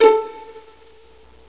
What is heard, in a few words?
VIOLIN A; INSTRMNT